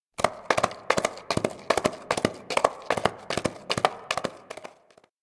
shadow baron run; anemoi

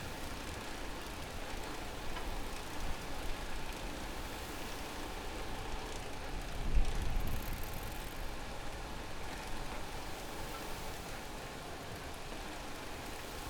outdoor road bike cycle

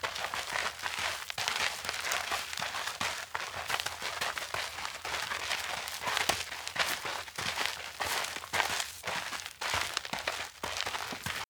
steps outdoor schoolyard shuffle kids LOOP; anemoi

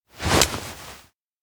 baton pass; koyomi

